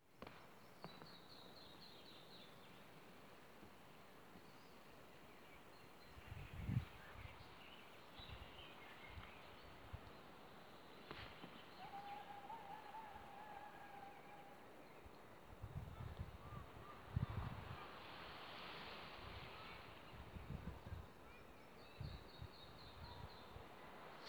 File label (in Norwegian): ukjent fugl